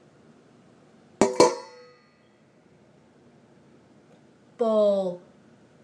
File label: bowl sound; semiotics of the kitchen